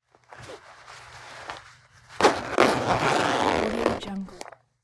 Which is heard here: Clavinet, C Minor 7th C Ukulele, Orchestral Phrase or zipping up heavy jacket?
zipping up heavy jacket